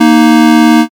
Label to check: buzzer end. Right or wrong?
Right